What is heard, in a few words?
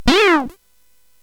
SFX; Narco Police; hvsc